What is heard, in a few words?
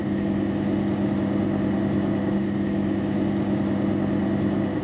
fan; cstrike